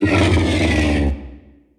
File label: bighorn death